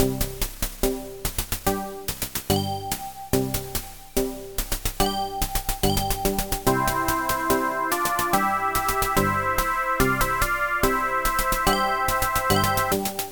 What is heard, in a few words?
drummer